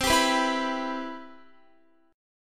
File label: C Major 7th, Guitar